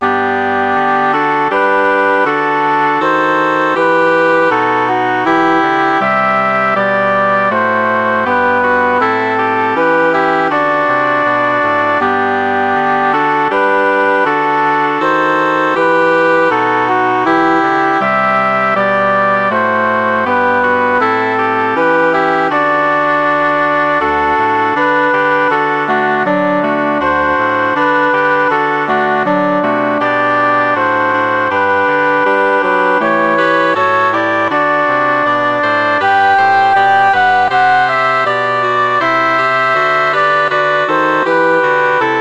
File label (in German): deutsche nationalhymne holzblasquartett